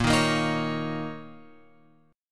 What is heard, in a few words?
A sharp Minor Double Flat 5th; A#mbb5 Chord {6 6 8 8 X 9} strummed